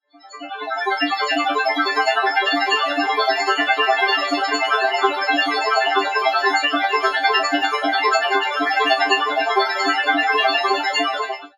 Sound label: Arp